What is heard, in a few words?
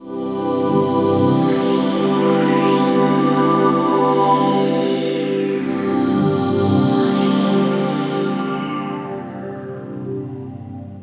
Pad, Melodic Synth; Drum & Bass